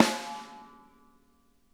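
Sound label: snare edge; Sonic Reality; Interactive Drum Kits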